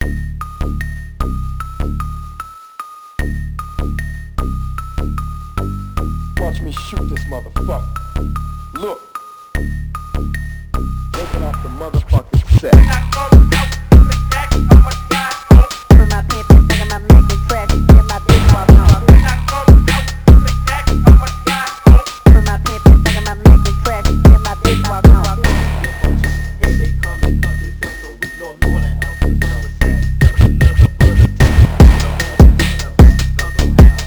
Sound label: Phonk